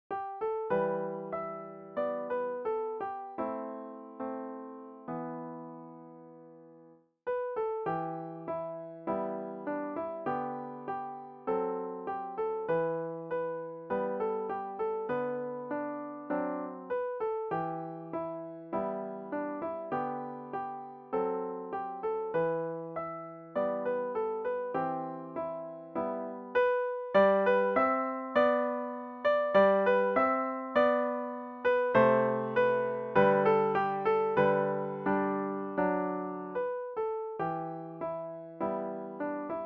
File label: Parting Glass, piano